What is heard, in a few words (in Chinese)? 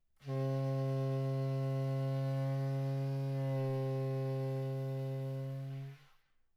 中音筚篥 筒音 自然衰减 力度中强